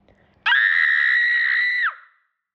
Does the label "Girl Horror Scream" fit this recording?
Yes